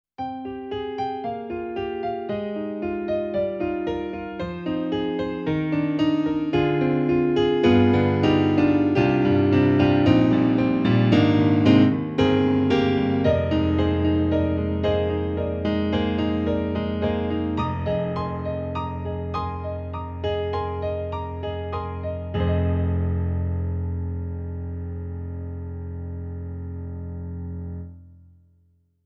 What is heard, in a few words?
Korg Trinity Mega Piano; Expansion Disk